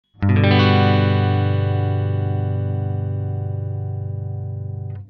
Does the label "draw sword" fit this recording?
No